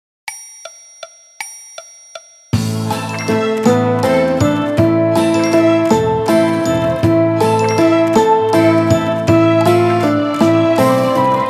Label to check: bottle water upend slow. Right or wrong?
Wrong